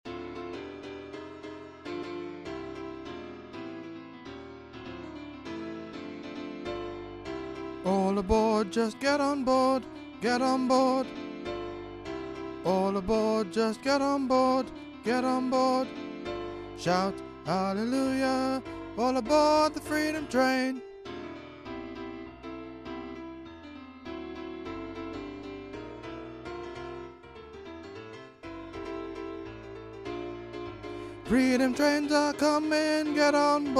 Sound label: freedom train tenor